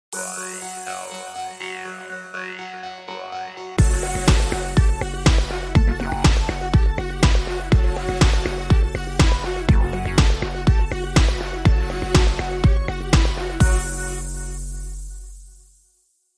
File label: Slow Motion Chase, Sting